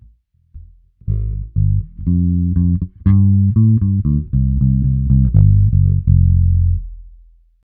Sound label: Bass